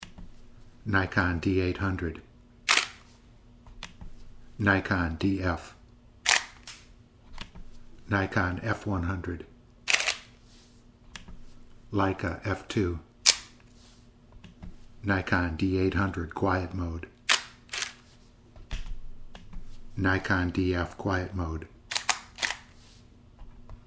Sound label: Shutter